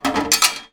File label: foley metal small object thin down; zapsplat